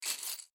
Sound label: pocket coins